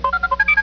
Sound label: green buzz in